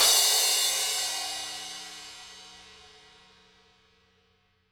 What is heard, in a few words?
CRASH, Interactive Drum Kits; Sonic Reality